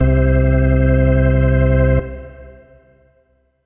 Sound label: Yamaha EX5 Fast Organ C4